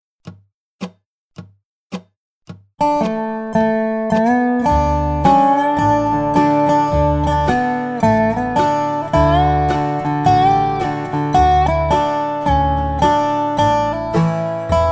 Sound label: Key of D Dobro and backing track